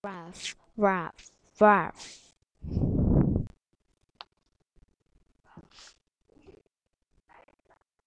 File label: laugh